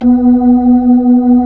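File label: B-3, Hammond B-3 organ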